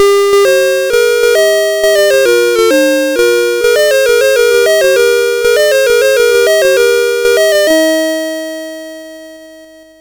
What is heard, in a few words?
sid CSG8580R5, GAMES; Alice In Wonderland